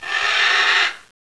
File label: Macaw; copan